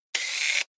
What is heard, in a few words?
Camera Lens